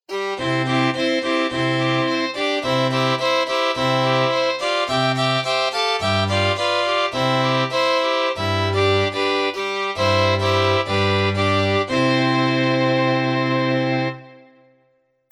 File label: strings; daigakushukutenjokyoku